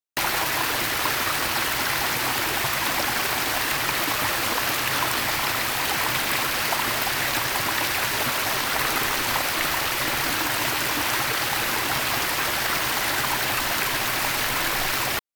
water stream large; best service Blue Box; water streams

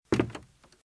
Footsteps - Wood - Creaky - Walk - Left

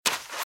Footsteps Sand Dry Run Right; RadwinIsland

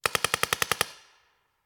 Paintball Gun; Sound Ideas; Guns

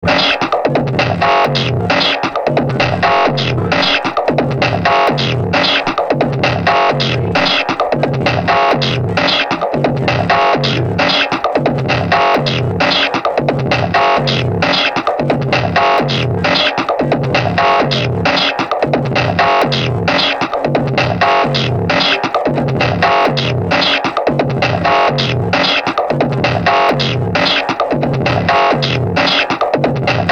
Electro; RoosterFX

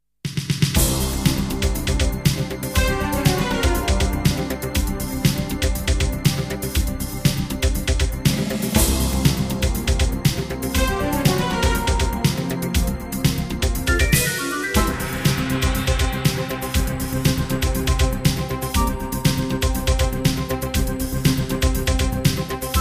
jingles; 90 DDD Jingles

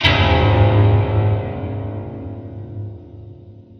METAL Tibetan Slam; Cinematic Metal Impacts

